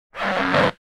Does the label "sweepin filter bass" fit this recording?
No